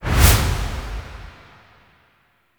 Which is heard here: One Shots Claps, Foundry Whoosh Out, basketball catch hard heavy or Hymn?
Foundry Whoosh Out